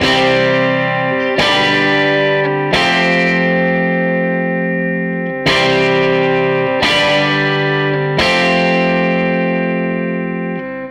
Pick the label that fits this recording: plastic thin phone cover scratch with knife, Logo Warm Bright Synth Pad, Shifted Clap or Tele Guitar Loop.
Tele Guitar Loop